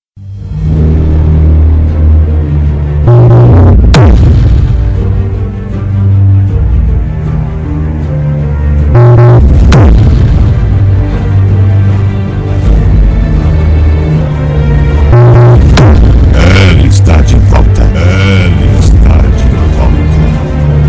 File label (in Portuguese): funk bass graves automotivo